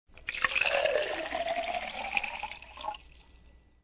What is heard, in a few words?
tinkle, tavern; AMBIENT; Pirates Of The Caribbean